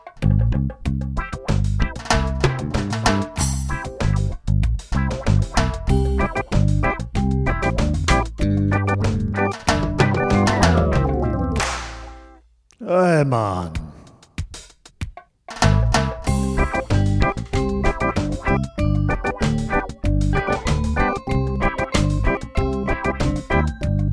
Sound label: reggae